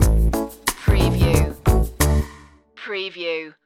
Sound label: retro video game labyrinth stinger